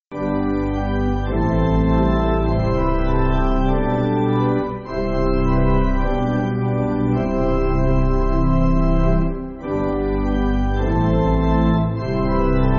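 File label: Pipe Organ, Backing Files for Churches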